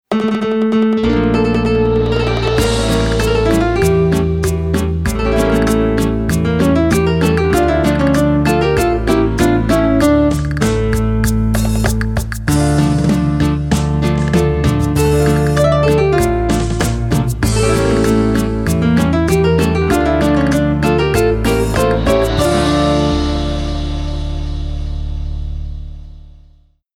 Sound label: Lowrey Romanticos